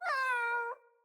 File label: Kitten Meow Playful, Enemies; Catbrow